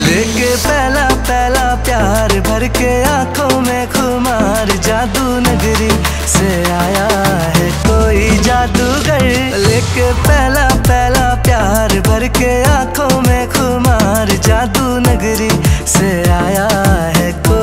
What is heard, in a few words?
Cover Song